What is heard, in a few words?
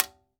H-TIMBA, TIMBALES